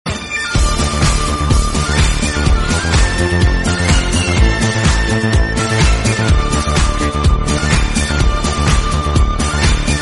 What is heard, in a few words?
instrumental, bgm